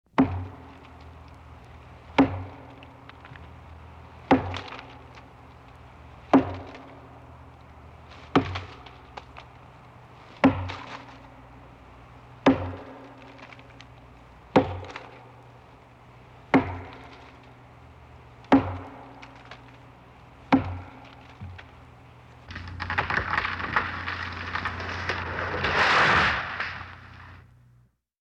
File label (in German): baum fällen, Auf dem Land